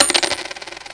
Expired

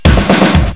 rytm

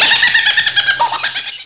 Heh

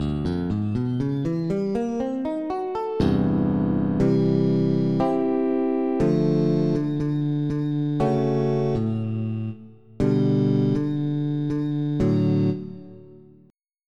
Bass Stick

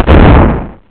blowup; MacMines 2.04

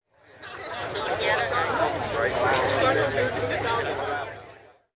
CROWD; zones; commercial

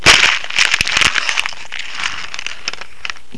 crash to ground; Macworld (1998-10); Games World; Shareware Games; Arcade; idin 2.0

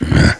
golem Move; NETSTORM

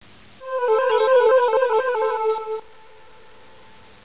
trill

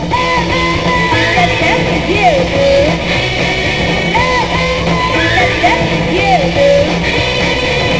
ooh.; DropMail

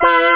gremlins chord

